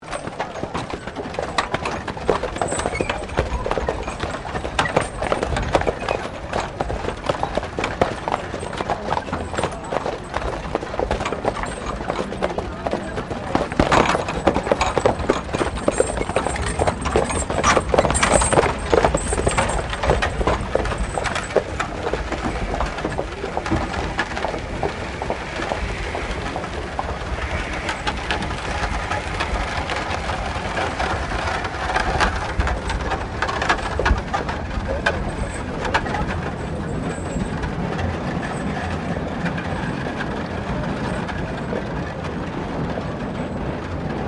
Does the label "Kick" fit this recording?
No